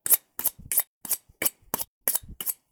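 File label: Sharpening Kitchen Knife Fast; soundscrate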